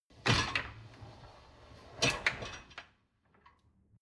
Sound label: dishwasher lower tray draw out long; maschinen; spuelmaschine